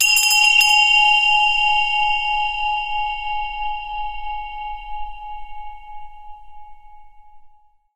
zen bell; Source Engine; tf; japan fundraiser

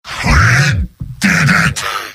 demoman cheers; mvm

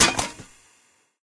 medal click rank unknown

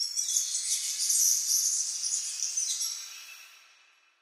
amb african birds; source engine; portal2

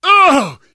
hurt major, survivor voice

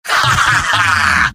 laugh short; demoman; video games; source engine; tf; mvm

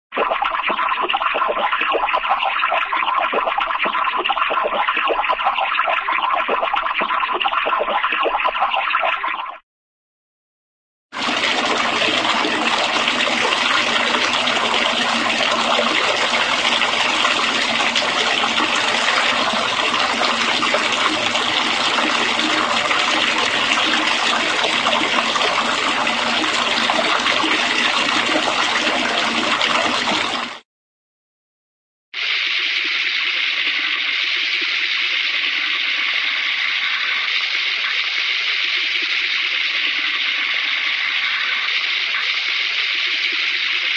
water, flow water running down drain; cartoon

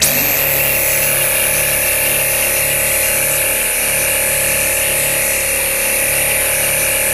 laser beam; Source Engine; Portal 2

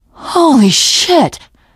violence awe, teen girl, survivor voice; source engine; left 4 dead 2

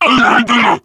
charger lurk, voice idle

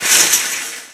metal chainlink impact soft

